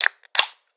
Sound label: can open, household; NS Studios - ultra sound collection